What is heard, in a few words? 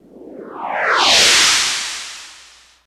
Stinger Sci Fi Whoosh